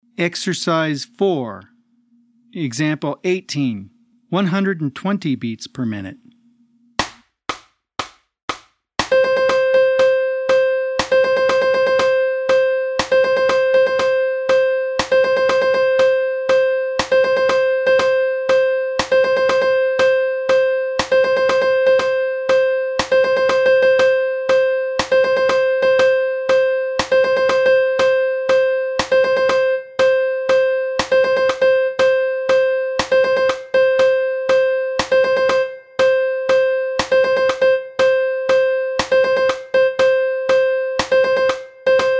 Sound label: 120 BPM